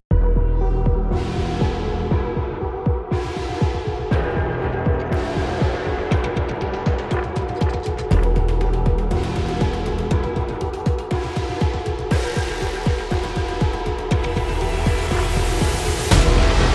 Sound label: Epic Cyberpunk